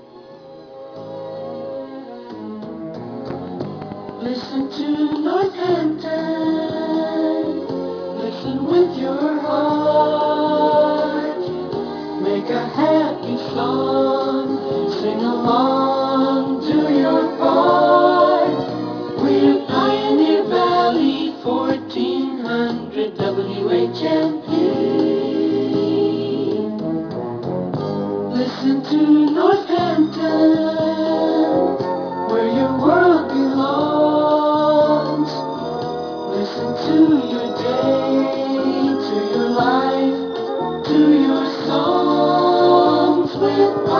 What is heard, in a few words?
Jingle